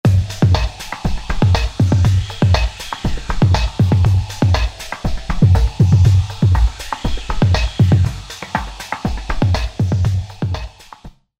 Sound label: Phatt, Roland SRX-05 Supreme Dance